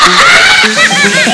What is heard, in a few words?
mad laff; People; Sound Library 2000; Wayzata Technology